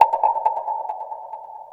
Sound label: percussion loops 138, Techno; PS-45